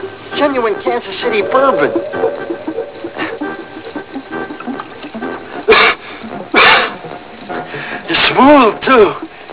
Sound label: smooth